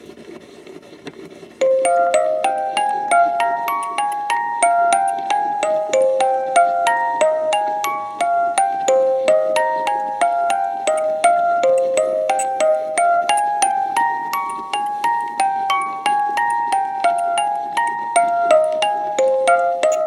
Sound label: Music Box pieces; Loghaven